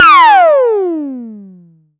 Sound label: E-Perc Digiding, Electronic Percussion; Drums; Ableton Live 12 Lite; Core Library